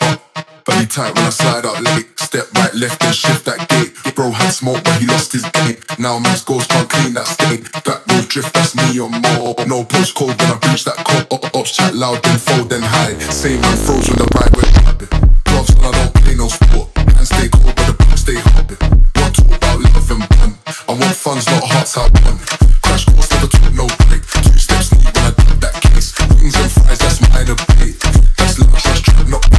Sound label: funk